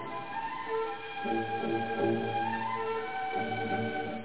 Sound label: earth default beep